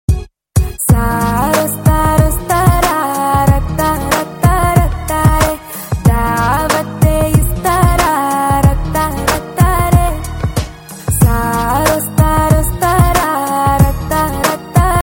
huawei guitar

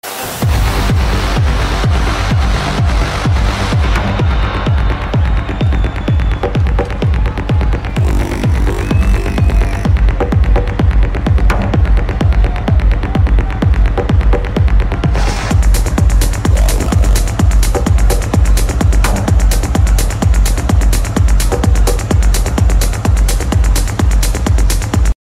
trance; belgium

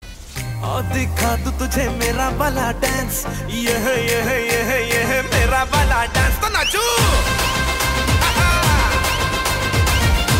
bollywood romantic

